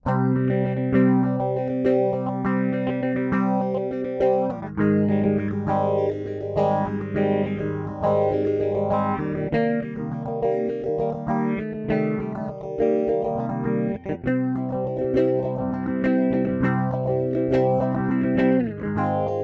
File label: Wampler Terraform Stereo Phaser